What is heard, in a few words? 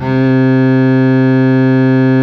Bowd Bass C5; Fairlight CMI IIe